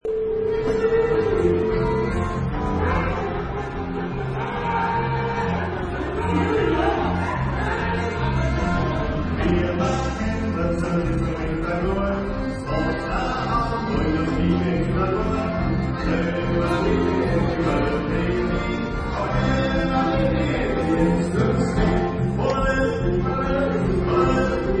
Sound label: German Beer Hall Party